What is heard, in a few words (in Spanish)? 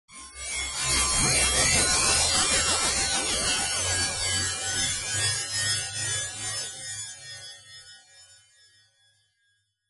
digital